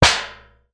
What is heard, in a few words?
tin lid hit; foley; zapsplat